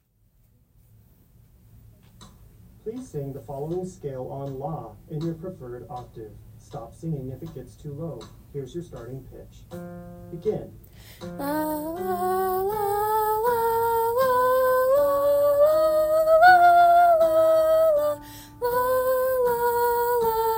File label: soprano; Audition